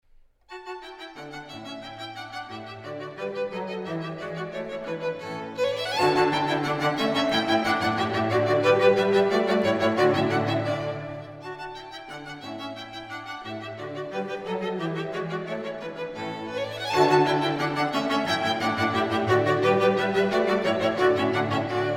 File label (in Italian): Divertimento; Trio Broz